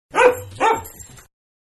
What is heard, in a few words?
Dog-Two Barks; Dogs; Animals; Manhattan Production Music